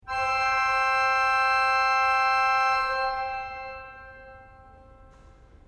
quintadecima 2 C; izola

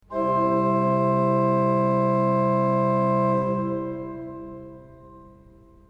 principale 8 C; izola

